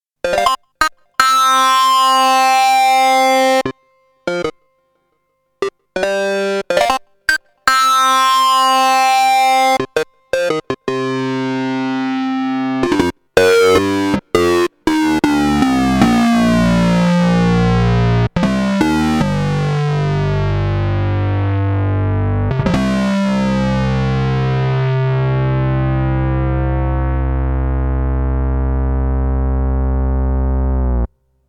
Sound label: jx8p sync